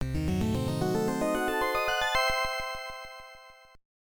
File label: jingle